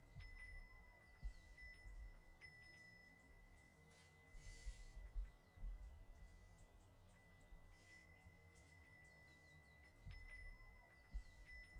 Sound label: 97 BPM E Min Chime; Melodies; Loop Stems; Cymatics; 2022 Melody Mystery Expansion; Sleepless Night